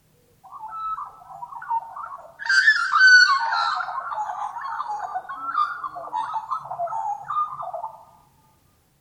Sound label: magpie